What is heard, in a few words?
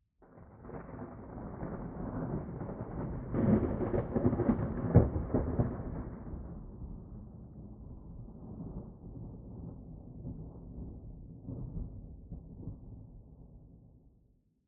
thunder - lightning - without rain wind background noise